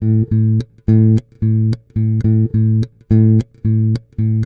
110 Pop Basic Bass A, E-Bass; Pop Rock

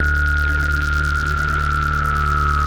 Tense Atmosphere - D 90 bpm; MIDI Clips; Tonal; Atmospheric; Ableton; Factory Packs; Mood Reel

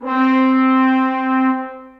French Horn Section Mute Legato; Ableton; Orchestral Brass

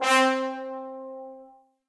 Trombone Section Sforzando; Ableton; Orchestral Brass

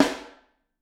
Snare Overhead; Ableton Foundation FreePacks; Snare Designer

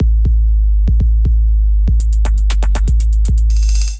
Kit groove 120 bpm; MIDI Clips; 89 - 120 bpm; Face Melt Kit; Ableton; Glitch and Wash

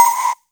space perc; LoFi Loafa; Ableton Foundation FreePacks; Wicked Kits

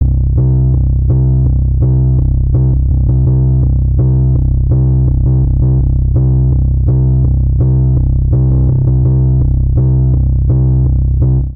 Maple Bass C Major 83 bpm, Bassline; Tonal; MIDI Clips; Drive and Glow; Ableton; Factory Packs

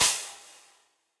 Crash FX Big Punchy, Cymbal; Drums; Drum Hits; Standard Hits; Ableton; Factory Packs; Session Drums Club

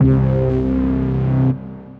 Reverse Fuzz, Bass; Drive and Glow; Ableton; Factory Packs

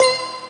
Bell; Acme Bell; Ableton; Beat Tools; Bells & Mallets

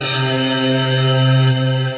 Pipe Strings; Guitar & Plucked; Ableton; Factory Packs; Drive and Glow

